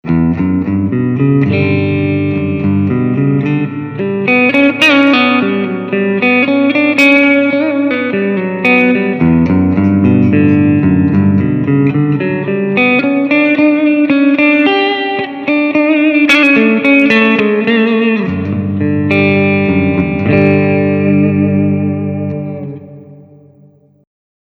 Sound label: Clean